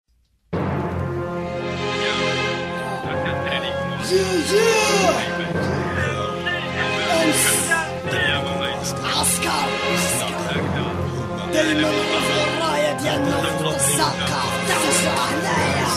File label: HipHop